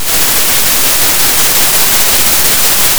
Sound of a thunderstorm

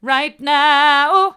right now hard, vocal

